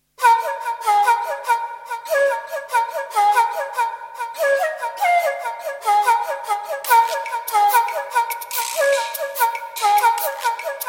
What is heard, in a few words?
sea beacon, elektronisch; Synthronics 1; Verdwaald op zee, vlak langs de kust...; Elektronische muziek